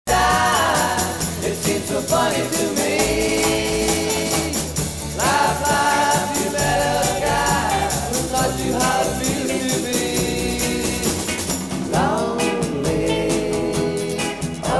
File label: laugh laugh